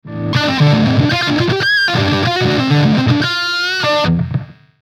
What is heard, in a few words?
JH3 dist OD, Marshall Guitar Effects Pedals